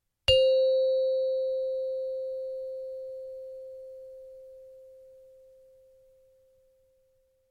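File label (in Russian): звонок низкий мягкий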